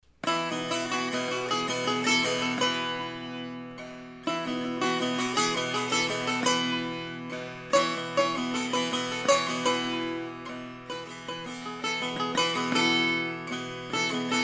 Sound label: persian setar, walnut wood